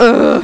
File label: Moan; MacFoxes Adult Game; Girl2Dungeon